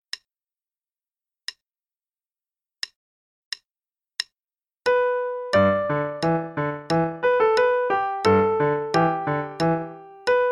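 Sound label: (quarter note=90); Piano; Song of the Range Rider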